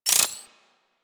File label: Credit Pickup Pile Small; UI; Pickups; Warframe